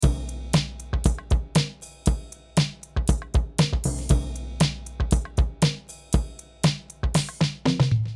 beat

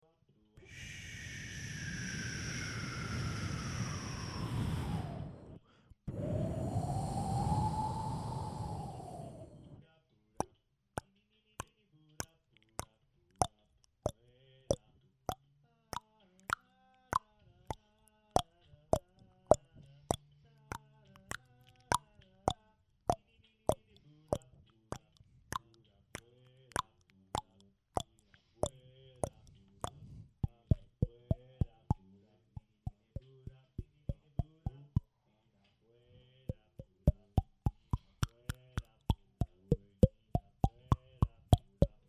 vocal wind and cheek popping

